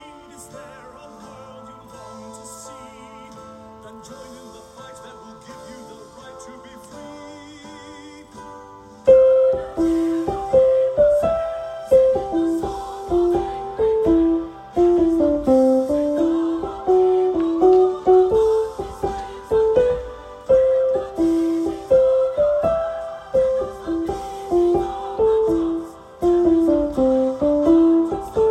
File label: alto